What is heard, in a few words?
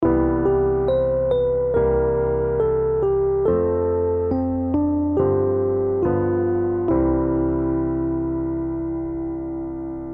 Melo Chord